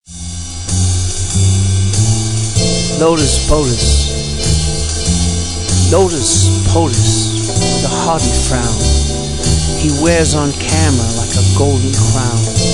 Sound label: Swingbass horns